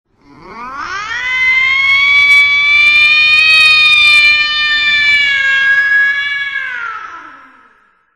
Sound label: Grudge Horror